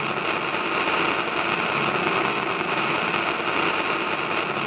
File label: radio